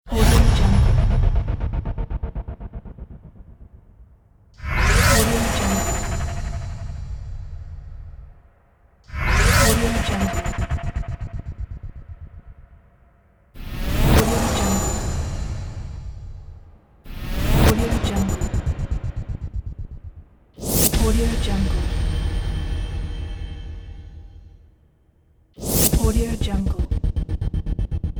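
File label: cinematic impact effects pack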